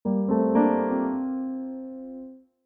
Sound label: Video game reward chest opening piano